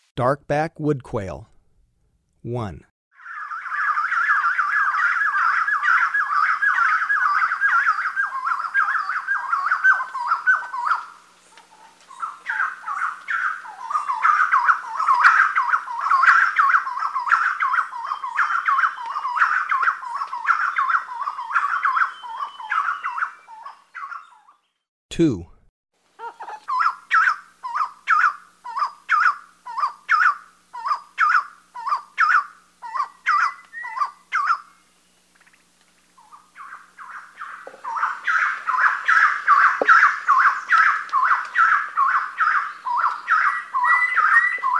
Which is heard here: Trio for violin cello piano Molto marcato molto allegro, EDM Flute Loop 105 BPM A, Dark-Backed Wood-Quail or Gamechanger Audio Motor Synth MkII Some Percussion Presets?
Dark-Backed Wood-Quail